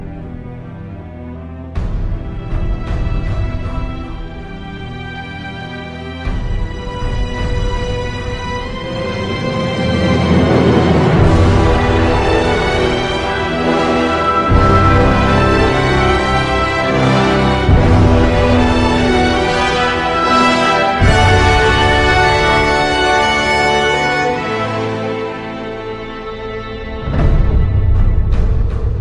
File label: loading screen music; Crysis 2